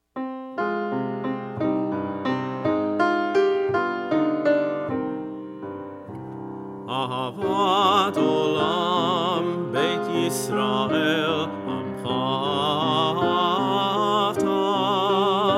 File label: Ahavat Olam, Cantor